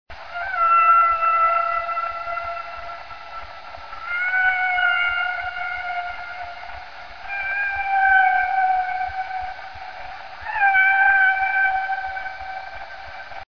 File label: hump